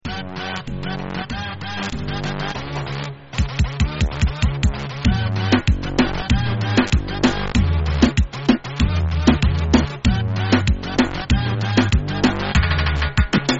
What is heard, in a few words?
Reggaeton